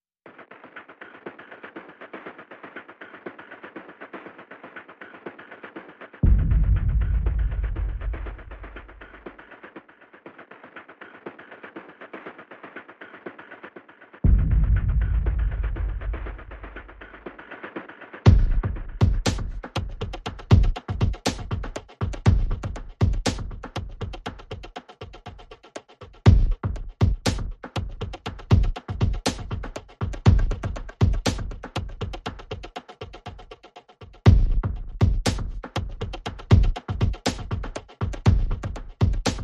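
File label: Percussion Only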